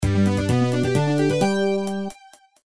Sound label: LEVEL COMPLETE; TRAP RUNNER BONUS